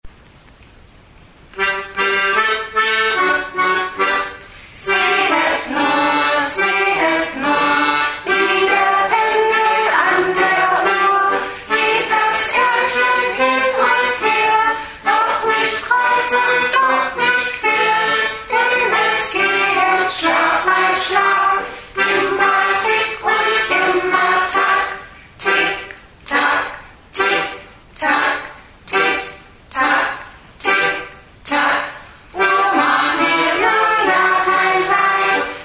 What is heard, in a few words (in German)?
Tick-Tack; froebel-lieder